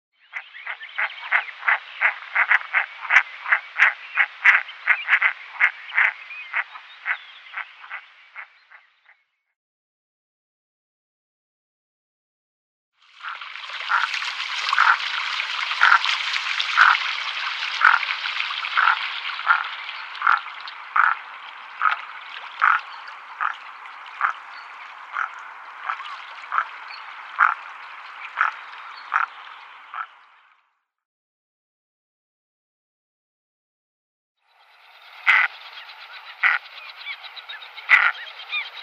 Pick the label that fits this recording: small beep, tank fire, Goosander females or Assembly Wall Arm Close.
Goosander females